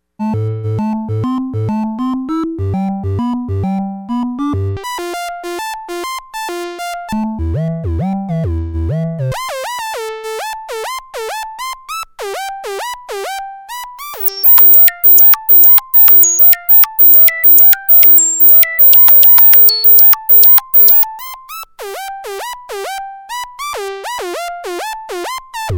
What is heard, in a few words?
glide, synths